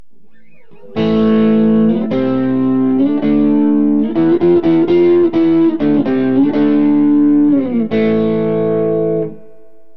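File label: demon neck, guitar pickup; burny; fugly clips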